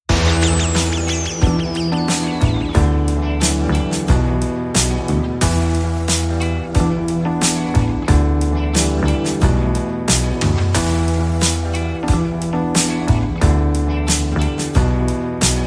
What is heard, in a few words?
Metal